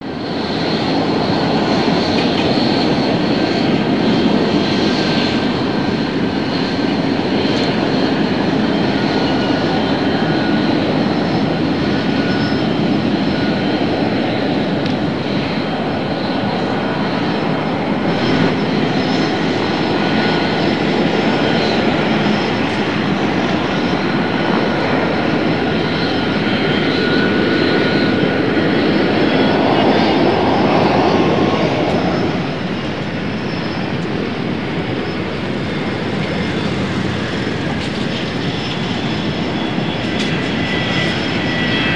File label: airport hall planes; best service Blue Box